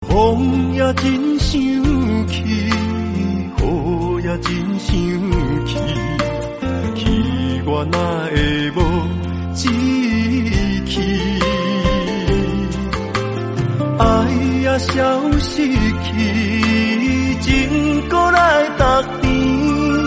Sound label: Chinese Ringtones